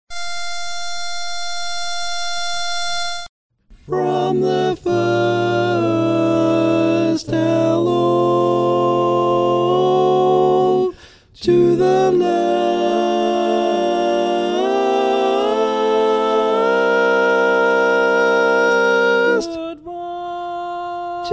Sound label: High Tag Tenor, barbershop tags; Polecat 2